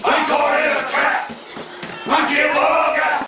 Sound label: Angry Grandpa sings